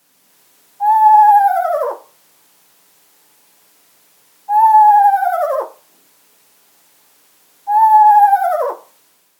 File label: Eastern Screech-Owl Trill